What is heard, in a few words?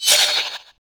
weapo